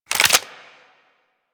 Clip Out, Weapons; Lato Vandal

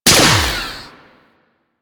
Mech, Weapons; Strun Prime

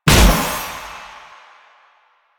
Kunai Proj Explosion; Warframe; Weapons; Choir

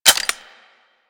Double Barrel Shotgun Clip In; Weapons

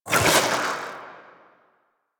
Vocal Pain; Warframe; Sentients; Conculyst

